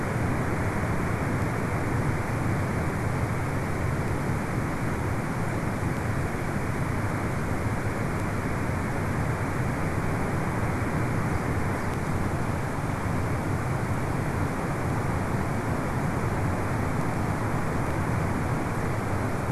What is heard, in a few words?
WFR audio emfisis